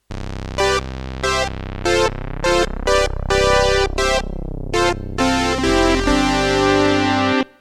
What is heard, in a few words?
jump, obxa